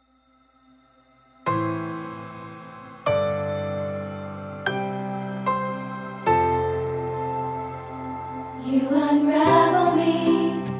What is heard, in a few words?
Soprano